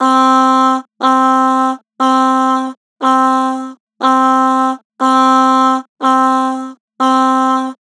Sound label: Aah, Vocals, Flat; Harmor Vocals